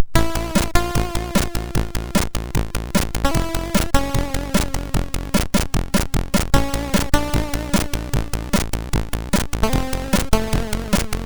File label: sid, MOS6581R2